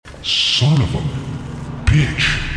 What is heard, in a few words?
sob slowed